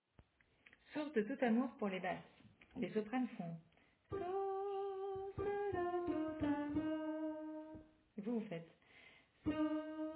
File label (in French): Basse